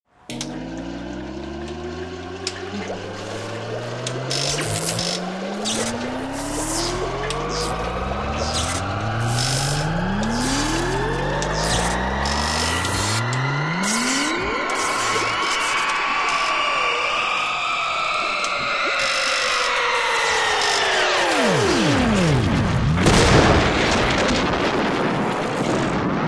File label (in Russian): zvuki mashiny vremeni ili teleporta